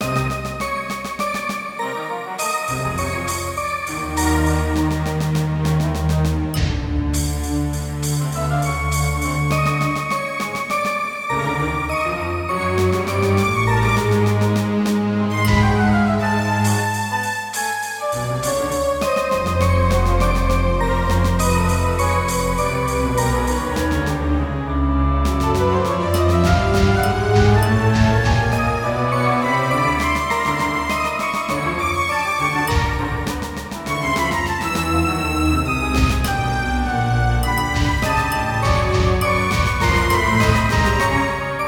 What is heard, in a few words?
Trap; Guardian Heroes; Saturn